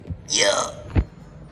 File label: gyaa